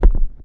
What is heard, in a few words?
bass, footsteps